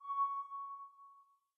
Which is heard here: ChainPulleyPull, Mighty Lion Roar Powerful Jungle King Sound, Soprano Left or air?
air